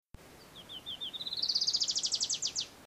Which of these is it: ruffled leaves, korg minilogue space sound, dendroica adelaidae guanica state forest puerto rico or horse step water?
dendroica adelaidae guanica state forest puerto rico